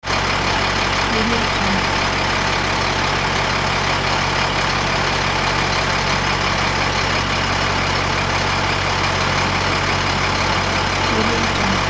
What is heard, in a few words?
diesel truck engine idles close up